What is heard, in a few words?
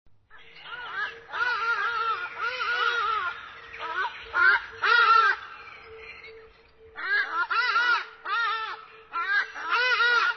Ibis